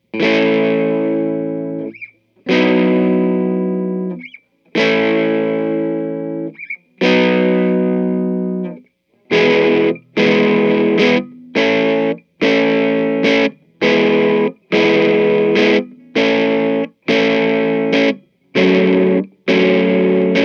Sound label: Drive, Vox AC4; Vox AC4 Mods